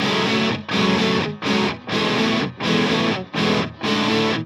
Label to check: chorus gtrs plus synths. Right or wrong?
Right